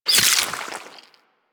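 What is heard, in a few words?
Infested Splitter Gore Spew Projectile; Warframe; Grey Strain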